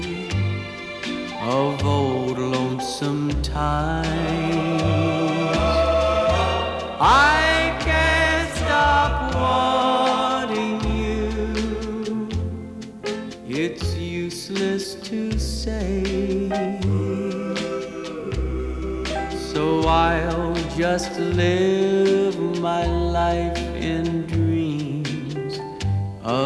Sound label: country